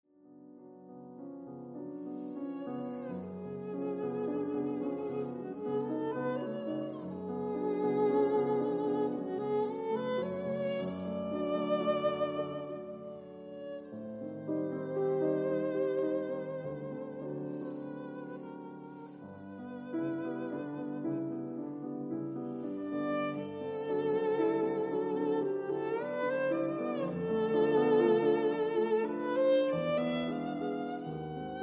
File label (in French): Sonate violon piano, Sonate, violon et piano; 3. Modéré, très à l'aise, au gré de l'interprète; Paris : Heugel, 1927; enregistré par Olivier Charlier, violon et Alexandre Tharaud, piano